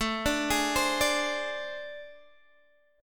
A Minor Major 7th Double Flat 5th, down, Guitar, Open D